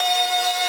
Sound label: synth main